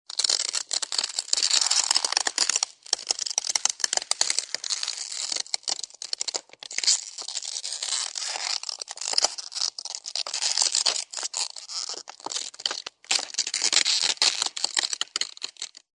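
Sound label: crackling underfoot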